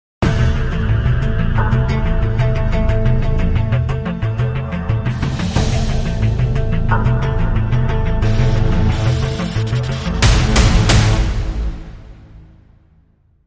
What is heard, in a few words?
Crime Sting, No Strings